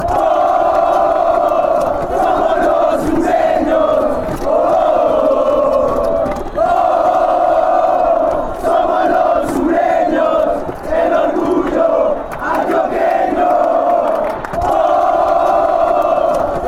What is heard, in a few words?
atletico nacional chant